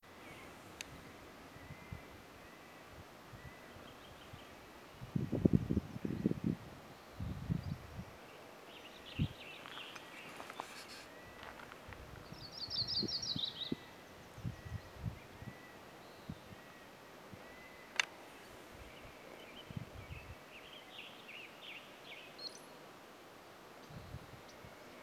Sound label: warblers